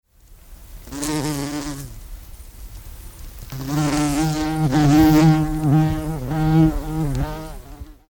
hummel, was summt

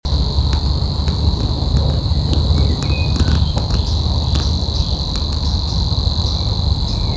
rohrschwirl

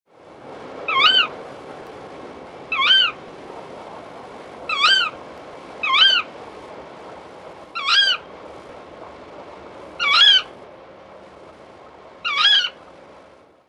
Waldkauz; nachttiere

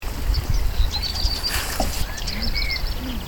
hausrotschwanz

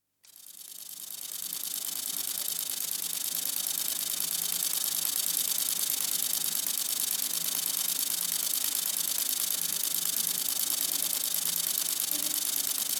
langflügelige schwertschrecke, heuschrecken; Smart Environment/Natura 2000 Living Lab Heuschrecken und Fangschrecken im Natura 2000-Geb

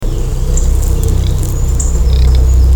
knaekente

